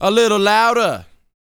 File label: VOX, R&B Dance, HIP-HOP